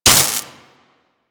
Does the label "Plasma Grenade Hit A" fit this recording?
Yes